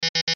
Beeps Chime Signal, Electronic Device; Blastwave

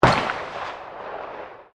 Pistol Tanfoglio 38 Super Firing Single Distant; Handguns, non military; Pistols (Sound Effects:Guns)

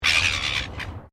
Bird Silver Gull Fighting, Australian birds; Birds (Sound Effects:Animals)

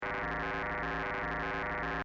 Scifi SFX Loop, Short; Stuart Duffield

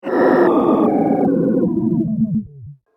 Robot Powering Down; Robot Sound Effects; science fiction sound effect; Mr Robot - Powering Down

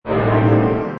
Hi Tech Panel Servo Button; High Tech Sound Effects

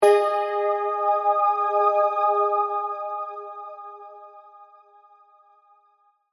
Multimedia Chime, Technology, Multimedia, Chime

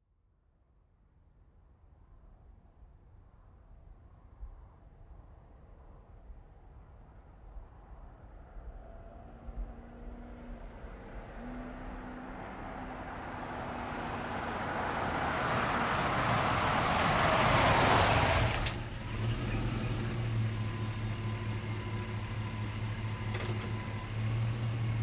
GMC Savana, Up, Stop, Fast, Truck; 2010 GMC Savana; Vehicles; Trucks

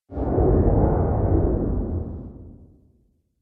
Underwater Element, Water; nature

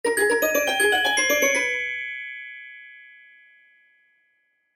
Glockenspiel; Glockenspiel Pizzicato Strings Melody Whimsical; Mallet Sound Effects; Blastwave

